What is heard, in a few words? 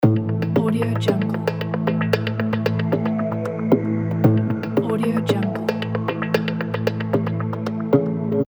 quiz thinking